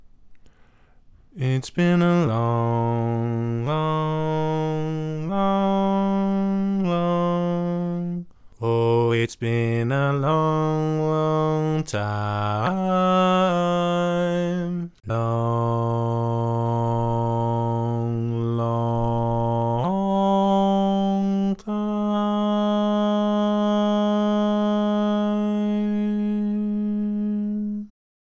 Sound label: Bass, barbershop tags